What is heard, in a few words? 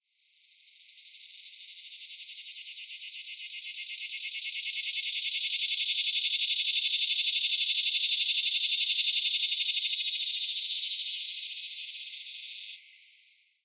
Neotibicen tibicen, cicadas songs; US